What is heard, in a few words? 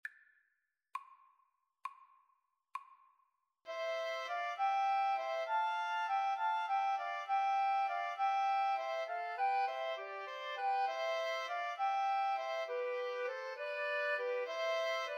pastorale flute oboe clarinet